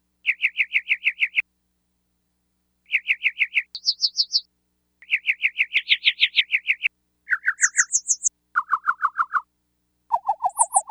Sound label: Birds, Yamaha DX100; Factory Patches